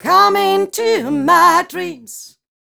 f mel d flat, fem Vocals; Mutekki Media; Diamond Vocals 2

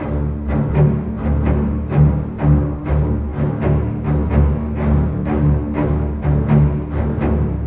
Cinematic Strings, Orchestral

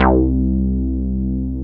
DANCE BASS; SYNBASS

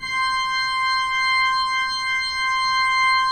pipe organ, 3-D Audio

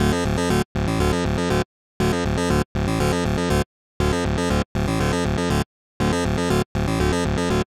120 Dm bass, bass loops, trance; Trance Explosion Vol1

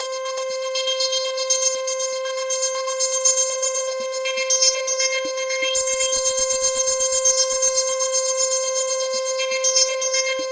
POLY SAW C5, Roland JV 2080

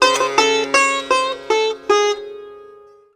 SITAR LINE; SITAR RIFFS2

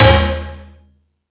Multi FX

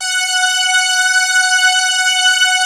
Prophet Five

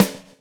GRV, Groove; AMG Gota Yashiki Groove Activator

